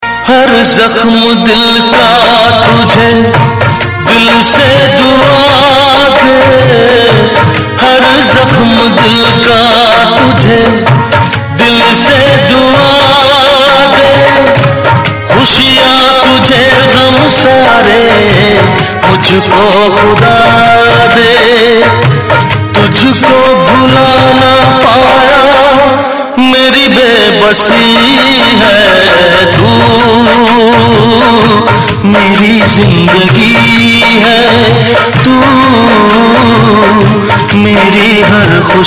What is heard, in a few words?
old melody